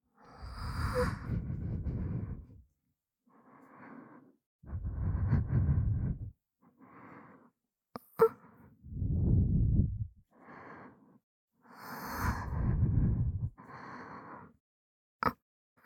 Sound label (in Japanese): (左)おまけの耳元囁き喘ぎエッチ, ASMR; 黒耳; 効果音無し版